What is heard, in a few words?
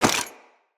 Loot Bag Drop